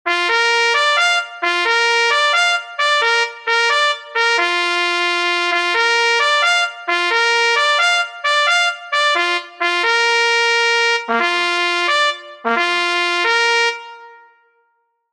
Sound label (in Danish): muflon 1 stemme; Muflon nr. 47; DJ's officielle signaler